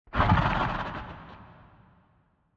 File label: Mud Dragon attack; Barotraumatic